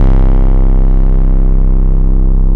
bass, 150 BPM, drum & bass; Ueberschall; Drum & Bass Resonance

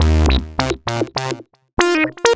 lead (brass)

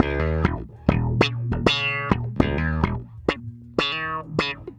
Bass Loop, Ambient Grooves

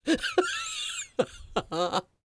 LAUGH, Jazz; Spectrasonics Vocal Planet; Jazz FX

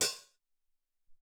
Mid Hat, Alesis SR-18

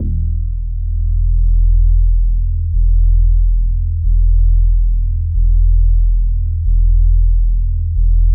E1, synth basses; Music Store Sound Service; Einstein

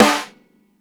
SNARE RIMSHOT; ONE SHOTS; BASEMENT DRUMS VOL 2

